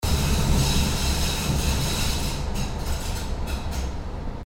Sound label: Subway; Grinding; Oberbilker Markt/Düsseldorf; Christoph Collenberg